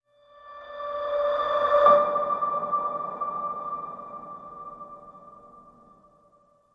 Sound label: Piano Ping Rev D